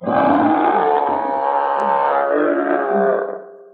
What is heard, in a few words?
monster; Animals